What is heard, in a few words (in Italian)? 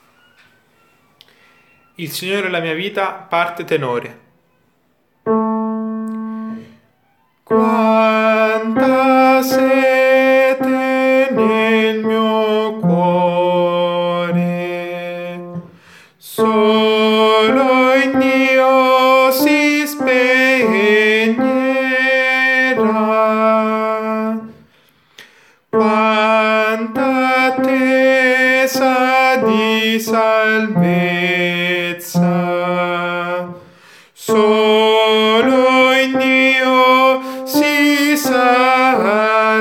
tenore